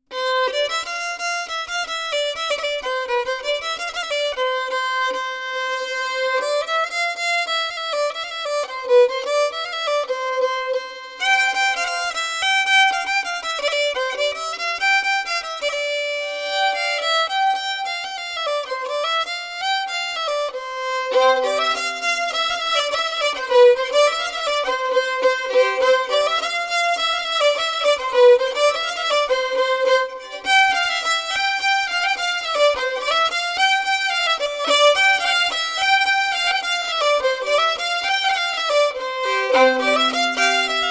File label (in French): Treger Bal